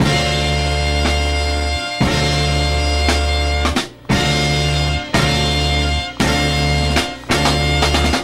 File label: all stabs